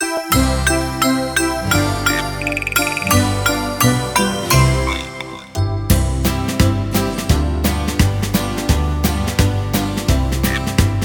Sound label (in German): Der Kuckuck und der Esel To play along with, recorder